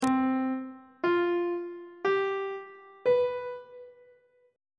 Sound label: d double flat major 7th suspended 4th chord intervals on treble clef